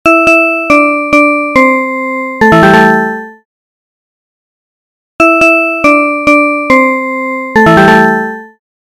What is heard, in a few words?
sweet, tones; Clock